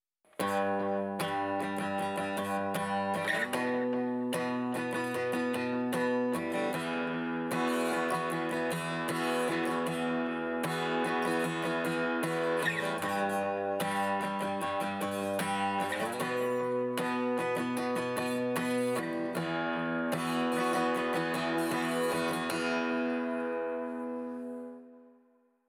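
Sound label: processed acoustic guitar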